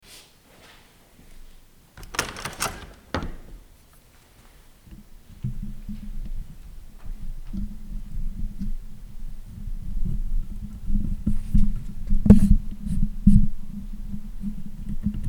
getting set to record thunder

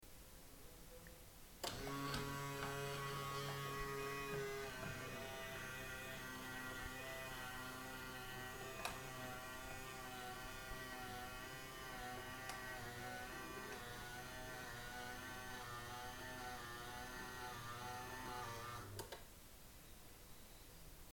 electric roof window opening

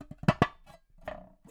LOOP Perc Mono

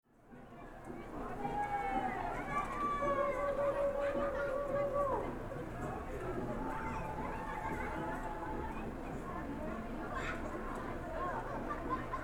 carnival brass band

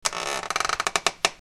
creaking wood

